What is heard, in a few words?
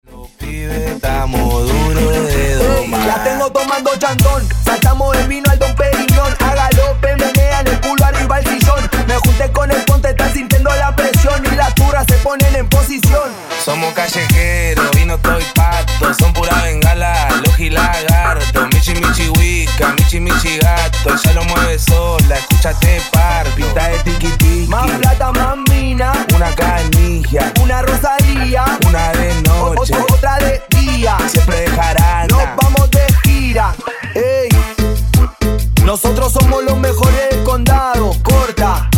Perreo Mix